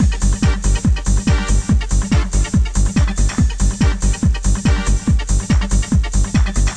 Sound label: Disco